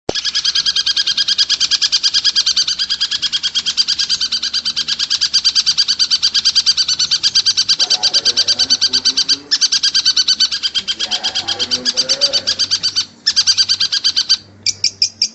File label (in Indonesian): audio cendet jenset